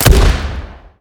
fire, 40mm; gmod; arccw uc commons attachments

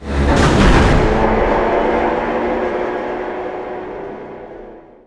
startup, turbo tank; gmod; lvs juggernaut turbotank

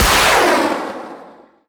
missile; planes; gmod; lvs planes; weapons

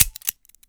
revolver hammer; gmod; arccw uc commons attachments

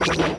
xwing shoot, weapons; gmod; star wars vehicles episode 1

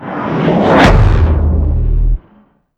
hyperspace; vanilla

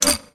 error; weaponweights content

